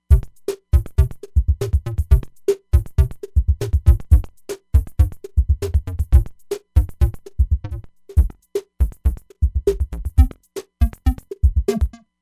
Auto RnB

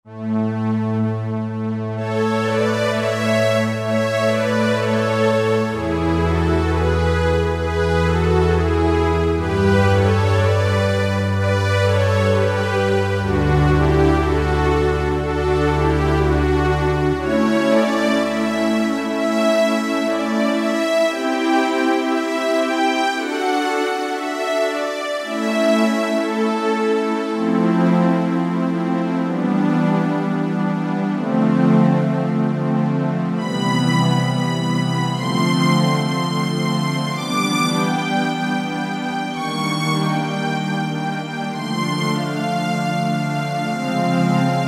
JP-8 Str 3, Roland JV-2080

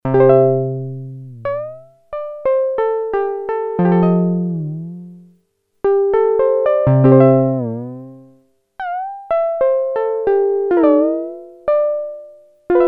Slide Guitar, Prophet-5; Sequential Circuits Prophet-5 (Ver.3); Factory Patch; File 3 Programs

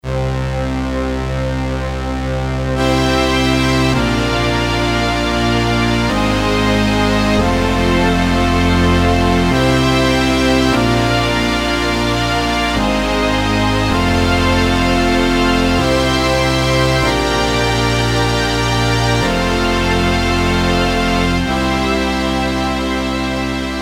SYNSTRNG, Korg DSS-1 Strings

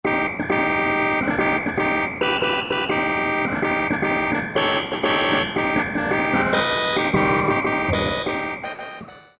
Yamaha TG33

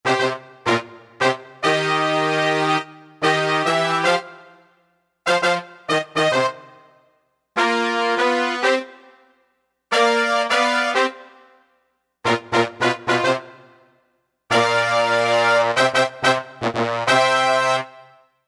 Brass Ens

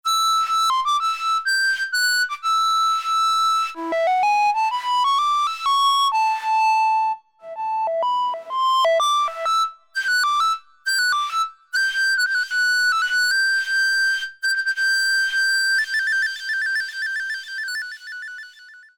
Lead Flute, Alesis Micron; Factory Programs

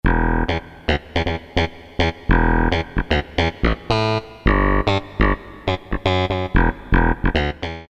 E. BASS, Korg DSS-1

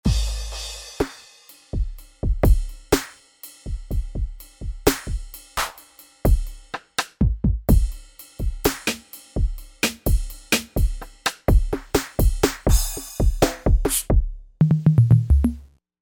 Bully Kit

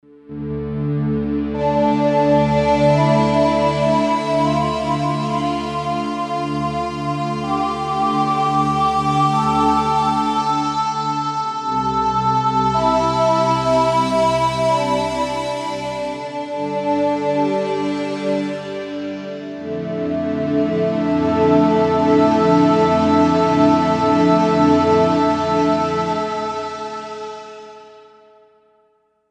Sensitive, Korg M1Rex; Factory Patches

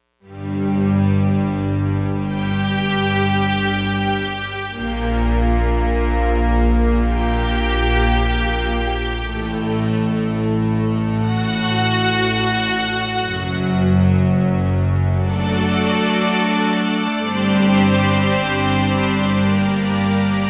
Octaves, synth

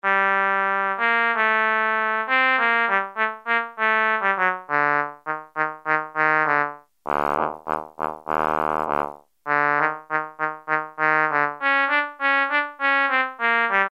English Horn, Roland Juno-106; Factory Preset

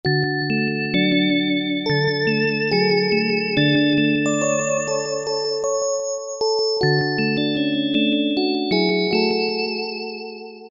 Deep Bell, Korg MS2000; Factory Patches

